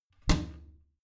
bathroom cabinet creak close heavy

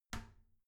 toilet lid close soft; haus-garten; badezimmer

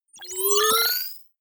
screen turn on whoosh; energie

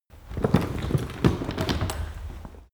cart medieval drag slow short, kutsche; verkehr transport

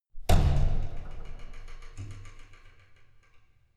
closing church door

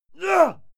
male fight attack hit; schreie; menschen; soundpool

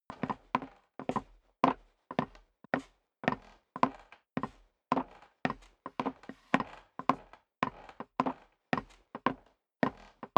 step boots wood creak upstairs sequence; schritte

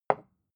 glass put down small; objekte

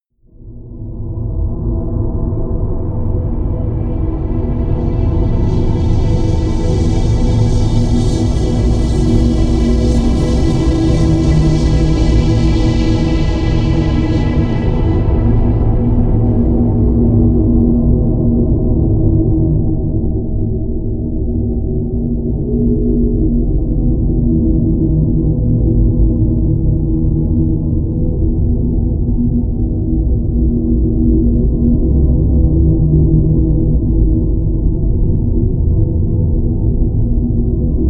drone moving air low

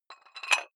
dumbbell put on rattling big weight; sport freizeit; fitnessstudio

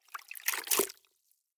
clothes water updown short soft, haushalt; haus garten

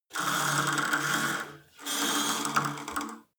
cable machine old pull release, fitnessstudio; sport freizeit

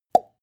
plop; soundpool

